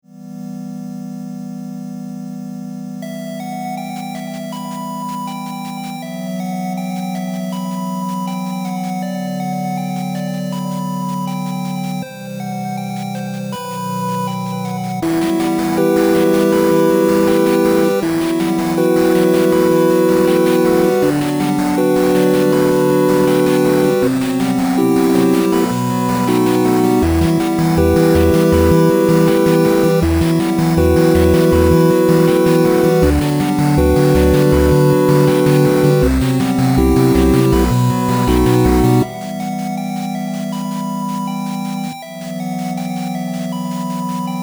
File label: 8-bit; Lenna's Inception; Dark Things Afoot